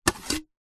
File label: household plastic plate pick up lift off another